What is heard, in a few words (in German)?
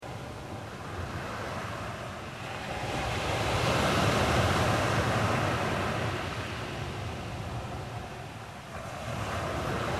Meeresrauschen